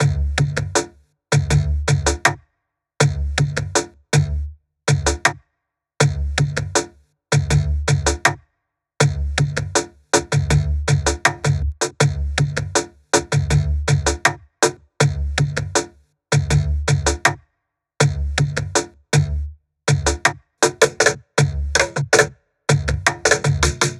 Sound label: 80 Cajon Loop